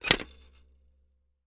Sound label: toy plastic jack in the box pop out